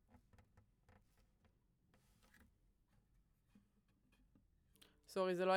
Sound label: GTR